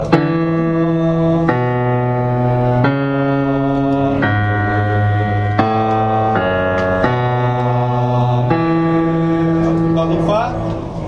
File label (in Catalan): amen baixos; Cor